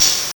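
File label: ChipShop GB Open hat; DRUMS; HATS; ChipShop Deluxe; ChipShop Hits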